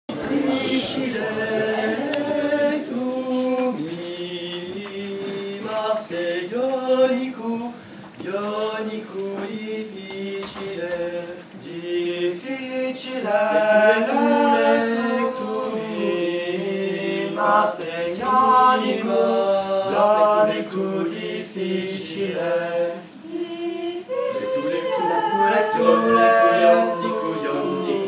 seconde canon

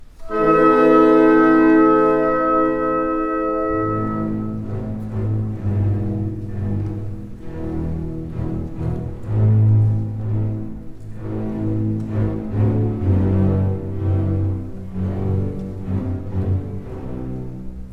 accompagnement en la mineur; Beethoven

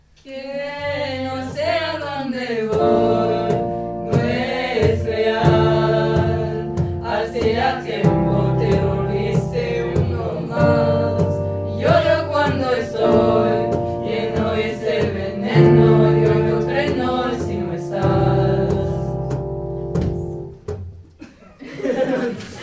piano plus fort

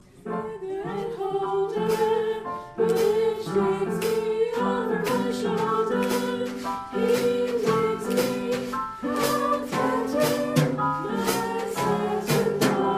acoustique

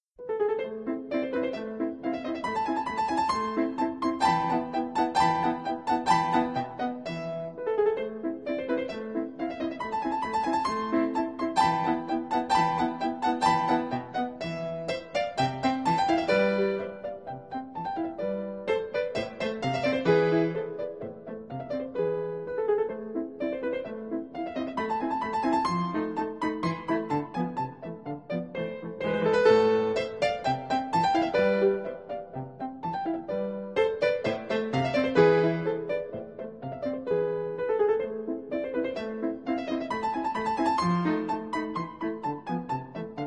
Marche turque

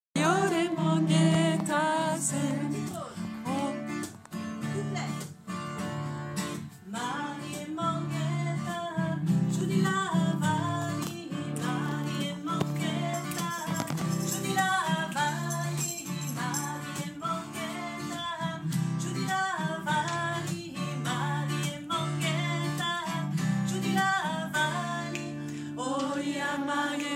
chanson d Inde, musiques du monde

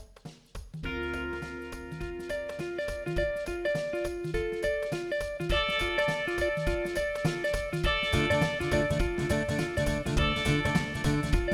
accompagnement; 2 couplets

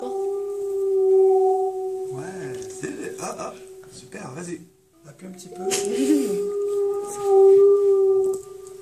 baleines

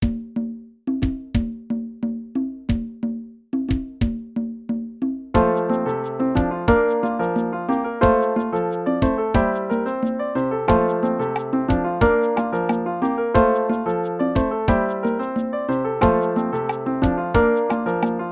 accompagnement

